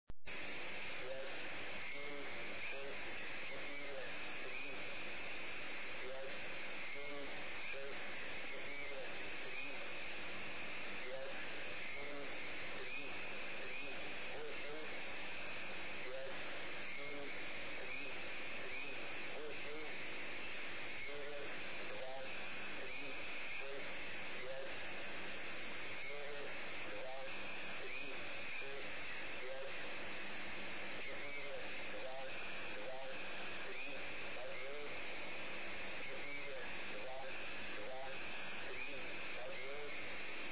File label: 3595 kHz AM